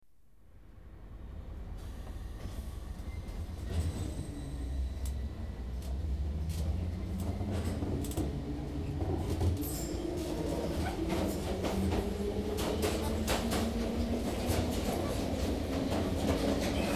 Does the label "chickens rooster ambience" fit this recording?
No